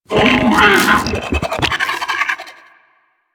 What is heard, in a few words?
Sentient Attack, Enemies; Warframe; DE; Sentients; Ortholyst